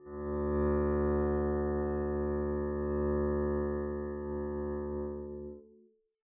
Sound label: arco d2 p down, double bass; dsmolken